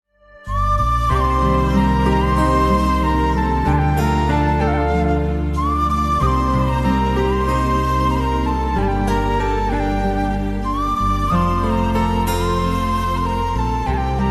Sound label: sad flute tone, flute melodies; south movie; viral flute melodies; sad love scene bgm; vellakkara durai